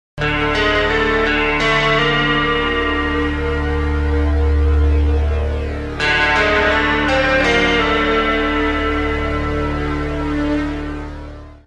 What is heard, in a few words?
guitar horror